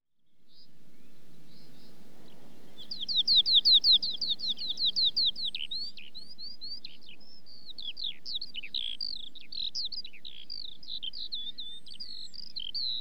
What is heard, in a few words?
Skylark, Song-Flight, With; Birds; BBC Sound Effects Library